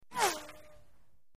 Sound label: Tonal Bullet Whizzes, bullet bys